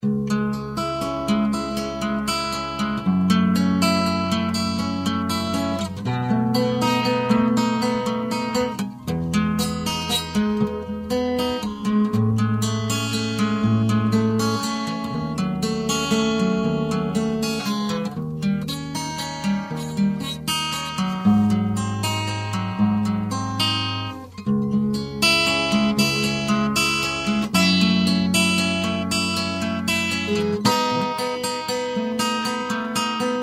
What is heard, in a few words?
guitar